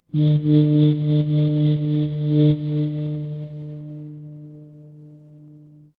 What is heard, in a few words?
fire electric hum